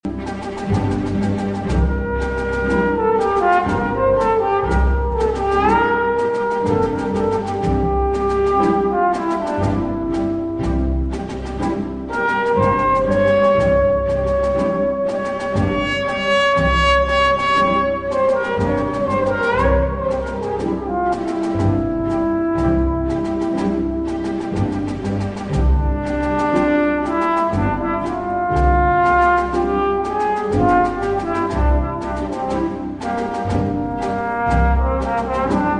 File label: Bolero Trombone Solo